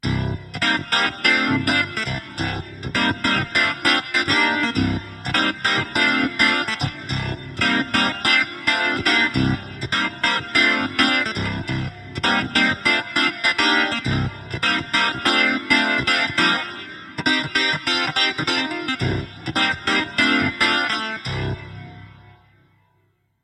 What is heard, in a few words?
Clavi; EHX Lester K